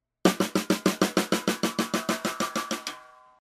PC Armonici

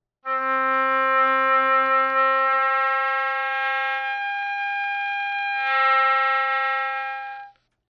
Armonici

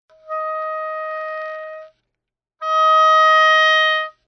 Piano Forte

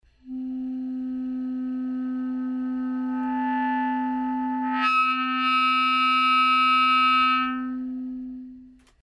CL Armonici

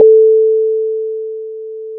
Corda freq

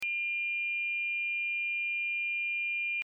Suono armonico

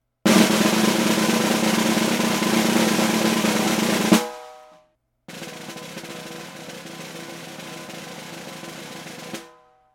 Rullo

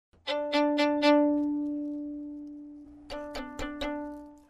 VL Legno Balzato

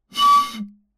Piccola Armonico

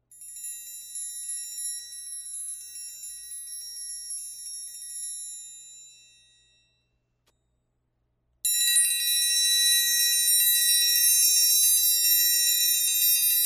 Triangolo Rullo

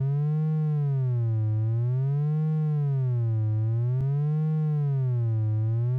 Terzo suono 128 FM

